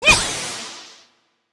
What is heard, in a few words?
Medica F Attack